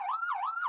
Amb Red-Corridor Siren Loop

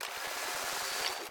Purple Mounted Camera Photo Print

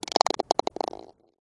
Sfx Golf

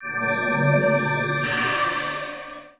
Great Heal; Knight Online

